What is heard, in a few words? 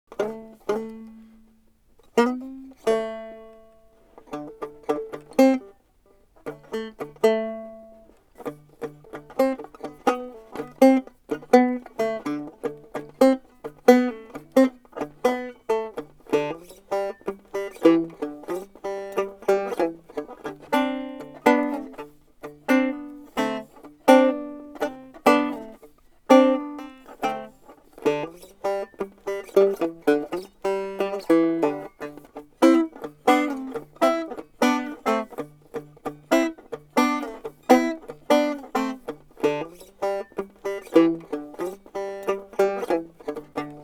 Scratch and Dent, instrumentals for banjo